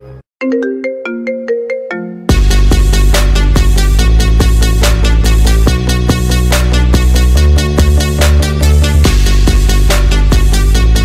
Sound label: Marimba Remix